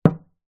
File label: household window knock x1 single pane